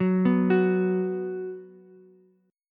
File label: B 5th B Ukulele, chord; arpeggiated